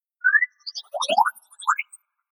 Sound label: SciFi Robot Chatter Computer Button Digital Hollow Space; Sound Design; Tom Hutchings